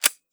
glock slide release; arccw go; glock18

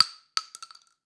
casing 12ga; arccw; weapons

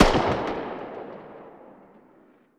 galil distant; arccw go; galilar

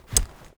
ursus flip, knife; arccw go

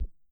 glock silenced distant; hl1 weapon

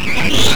floater attack; hl1 npc

